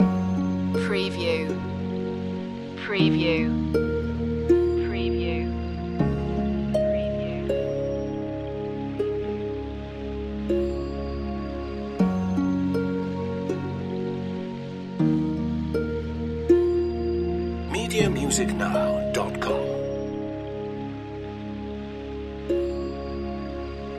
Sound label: gentle stream loop